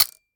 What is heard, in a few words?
manual trigger; gmod; arccw uc commons attachments